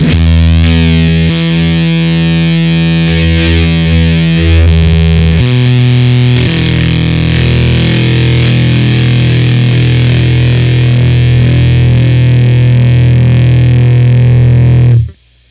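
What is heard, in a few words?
Fuzztest guitar preamp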